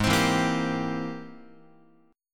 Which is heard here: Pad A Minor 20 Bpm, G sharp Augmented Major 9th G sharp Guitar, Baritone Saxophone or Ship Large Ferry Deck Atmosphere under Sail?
G sharp Augmented Major 9th G sharp Guitar